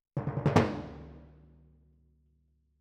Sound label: Timp Close, RNR for piano